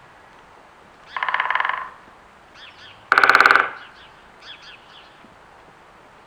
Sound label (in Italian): Verso del picchio; punto 3 sentiero della fornace